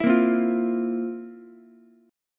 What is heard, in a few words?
F Major 7th Suspended 4th F, Ukulele; FM7sus4 strummed; JGuitar